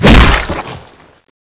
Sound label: mace hit; tyrantmace; cstrike